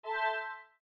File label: Windows Battery Critical; Windows 7 Sounds; Quirky